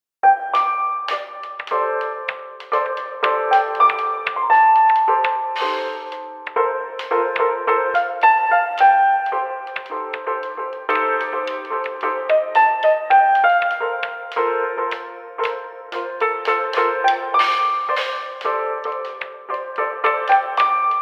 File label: Soundtrack; starbites